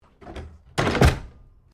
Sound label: Train Carriages 1937 Door Close